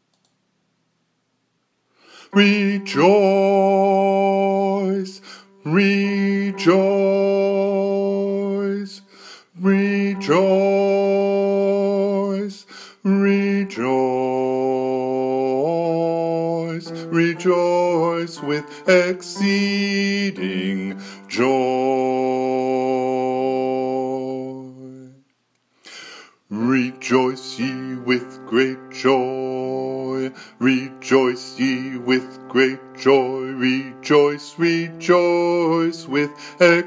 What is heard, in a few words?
bass